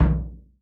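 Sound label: bass drum MED, 50S JAZZ; Northstar - New Gold VOL-1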